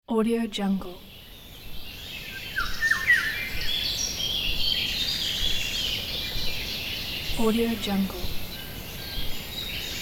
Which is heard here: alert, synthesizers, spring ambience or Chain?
spring ambience